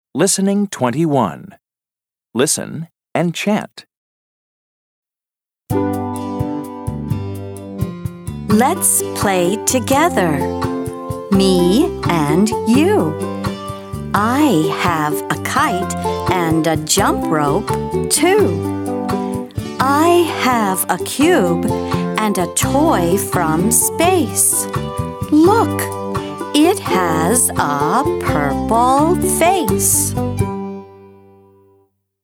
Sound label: Chant